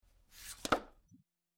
open; love

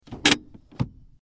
car hand Brake

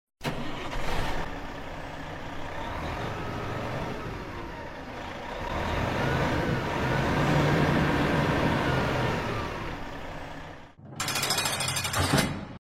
bus; police

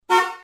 horns

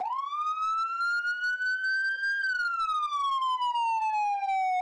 samu, sirene

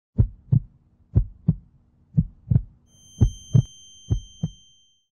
stunned

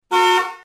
horns

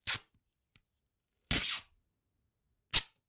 tape

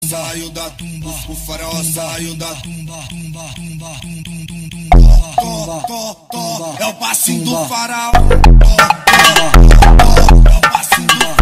funk

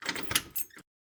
sucess

